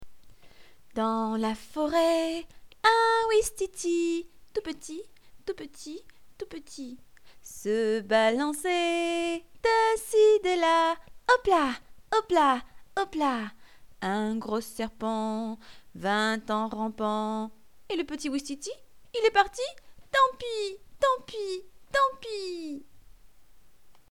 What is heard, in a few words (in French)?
Ouistiti chant